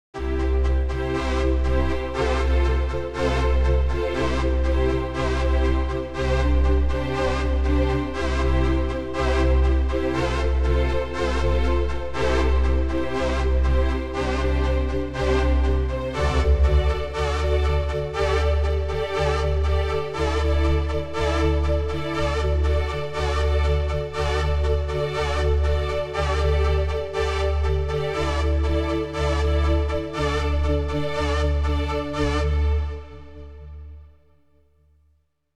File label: workstation strings multi; kurzweil k2700